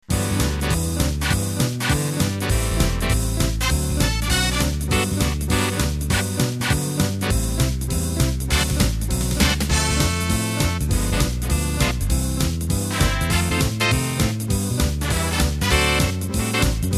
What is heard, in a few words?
New Jive; Works for very fast swing, jive and jump blues tunes; An updated take on an old fast swing style with Acoustic Bass, Acoustic Piano